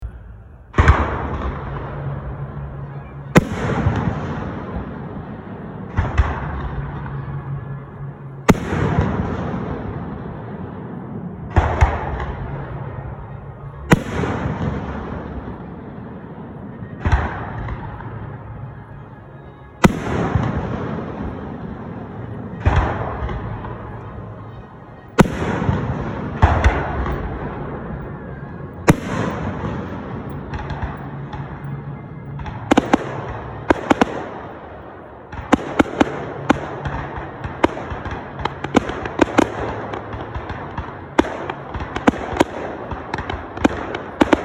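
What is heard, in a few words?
saliut, fireworks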